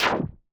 Battle Hit Light; Era's Time Delivery Service

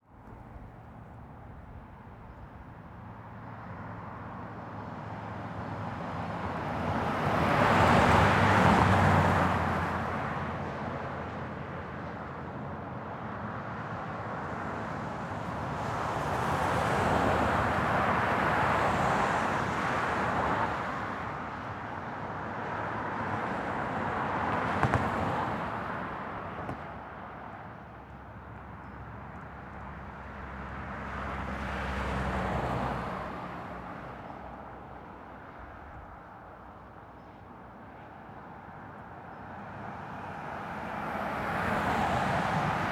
Traffic; Taylor

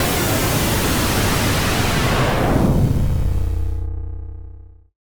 Death Enemy Big; Eminent Domain

